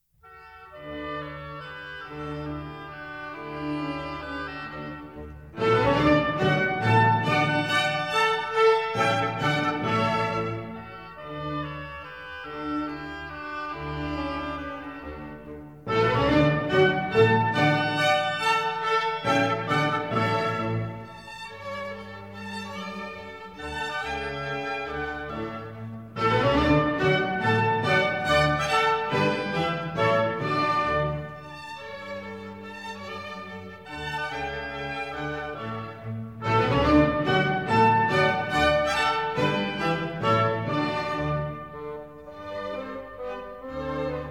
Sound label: Minuets, D major; Mozart; Dances and Marches